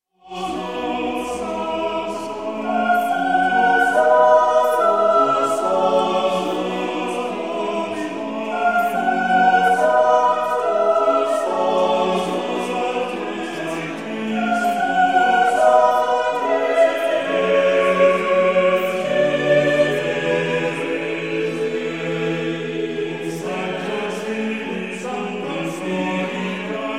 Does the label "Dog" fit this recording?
No